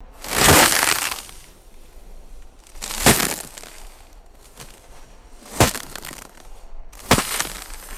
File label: crunchy snow chunks hitting soft surface